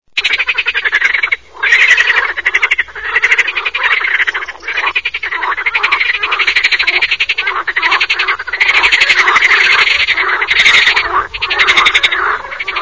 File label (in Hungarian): kecskebeka frogsound